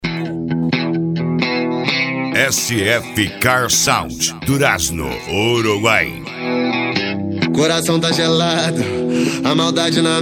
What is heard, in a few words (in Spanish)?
reggaeton pancadao; sf car sound uruguay